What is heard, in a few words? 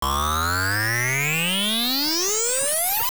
Charge; Nightmare Shadow; Second form (Agahnim) charging an energy attack